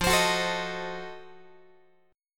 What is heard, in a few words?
F 7th Flat 9th F down Guitar; F7b9 strummed; x,13,15,16,13,14